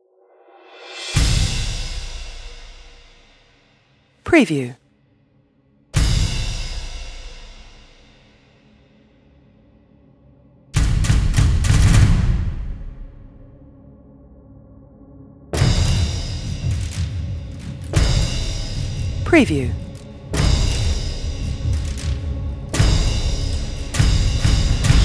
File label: Cinematic Horror Fear Scary Thrilling Tension Trailer Intro